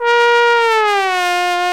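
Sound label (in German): POSAUNE ABGANG; Best Service; Alpine Volksmusik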